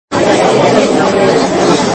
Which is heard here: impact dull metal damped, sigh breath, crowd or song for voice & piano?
crowd